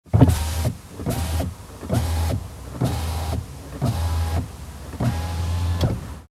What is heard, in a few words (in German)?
Auto Fensterheber